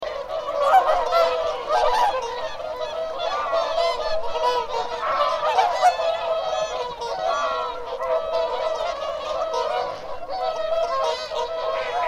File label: Whooper